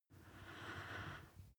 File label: breath female inhale